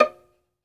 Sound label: Synclavier Strings, SOLO VI; ILIO